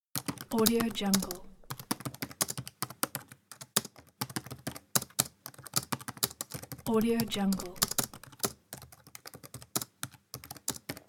laptop keyboard typing

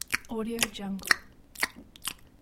chomping loop

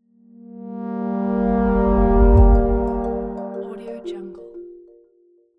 ambient intro logo

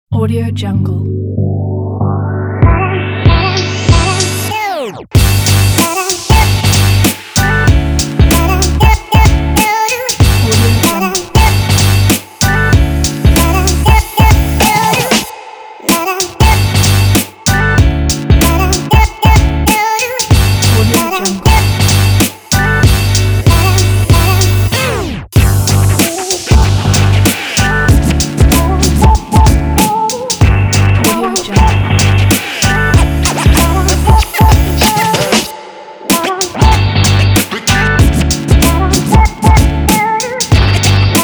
hip hop positive

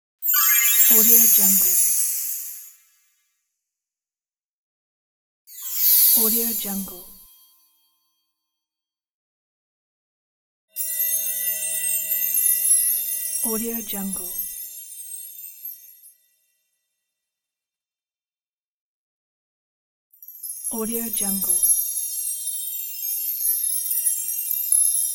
magic sparkles

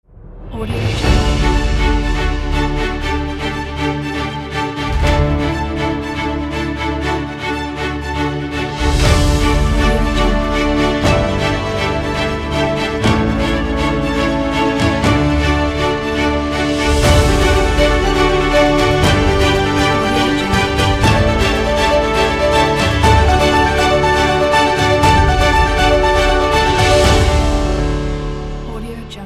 victory awards fanfare ident

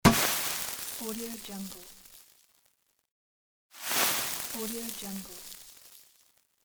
confetti gun; tibasfx

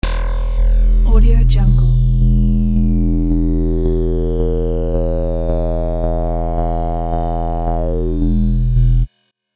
low frequency amplitude modulation; soundmasterfx

